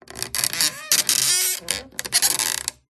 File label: Stress Creak Squeak Groan; PMSFX